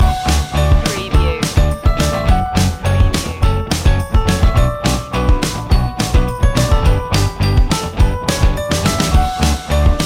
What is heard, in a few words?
positive indie loop